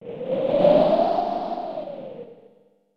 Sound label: Whispy Blow; Super Smash Bros